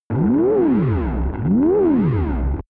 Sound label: alarming signal